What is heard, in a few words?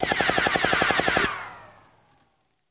Machine Gun Fire Rapid Burst With Bullet By Whooshes; single burst